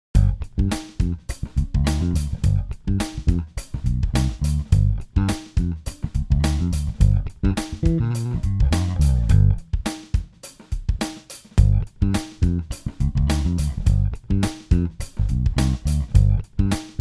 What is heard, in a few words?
Passive Jazz Finger Tube Tone Subtle; BG500